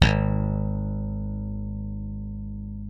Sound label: G#1, slap thumb; Xsample VOL-1